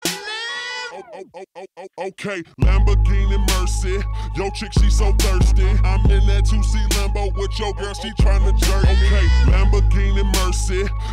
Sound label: buuu